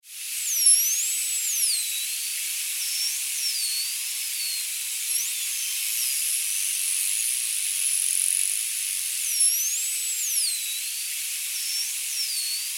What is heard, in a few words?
Bairds Beaked, Voices in the sea